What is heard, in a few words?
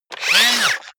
robot movement fast short